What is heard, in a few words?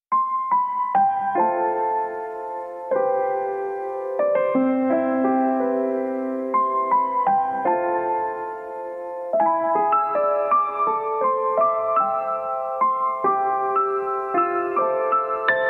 Piano